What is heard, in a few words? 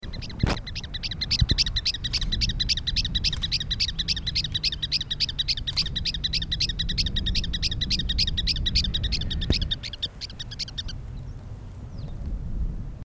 Campo Miner